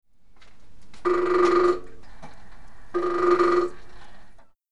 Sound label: concise woodpecker